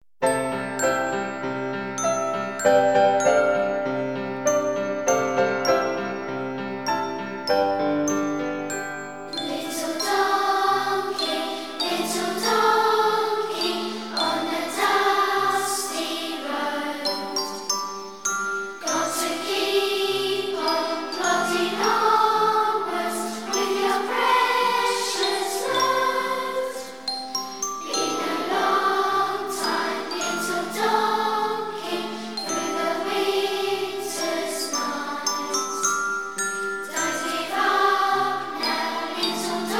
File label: the childrens choir; Christmas; carols